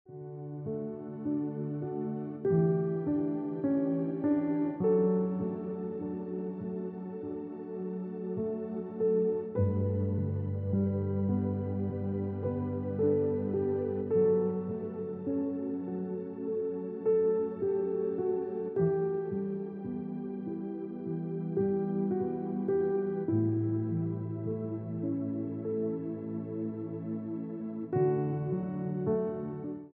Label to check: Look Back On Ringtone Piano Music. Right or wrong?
Right